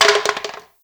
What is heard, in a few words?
Hit; moul